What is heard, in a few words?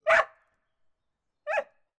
dog labrador barking external